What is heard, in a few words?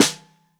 SN Bottom, Snare